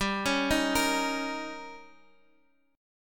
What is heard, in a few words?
D sharp 7th G, Guitar